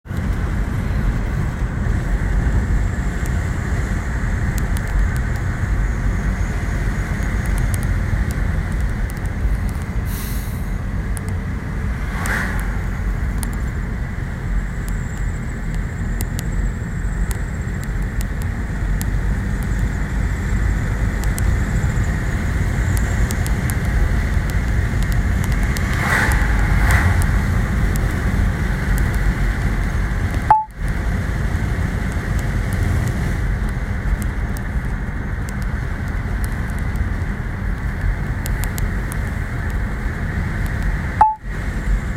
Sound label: beep mazda 3 dashboard mazda beep